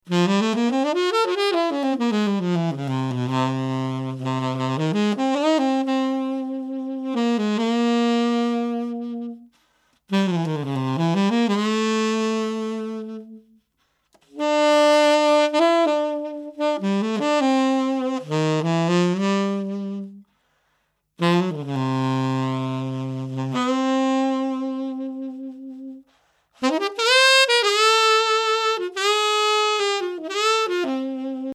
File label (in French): sax, son d'origine avec lequel l'aventure commence (un son cru sans eq ni compression)